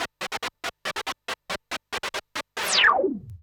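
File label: synth electro 140 A; Techno; Keys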